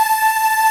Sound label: Ending loop